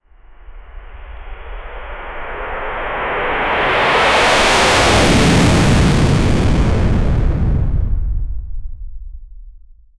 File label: the overloaded sound of a, take off